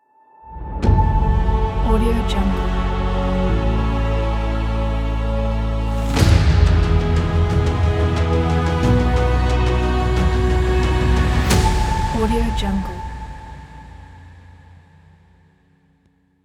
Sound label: cinematic intro logo